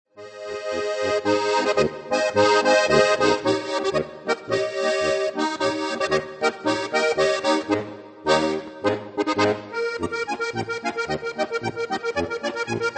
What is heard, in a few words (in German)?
lustige harmonika